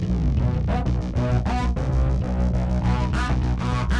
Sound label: bass; musdb18